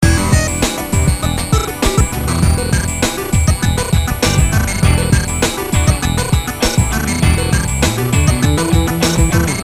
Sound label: loop